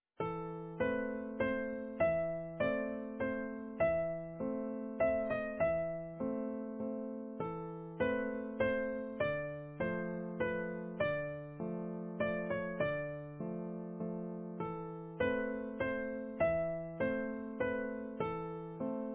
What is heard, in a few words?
Easy Piano